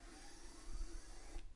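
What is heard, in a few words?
bar stool sounds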